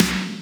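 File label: dance snare, Boss DR660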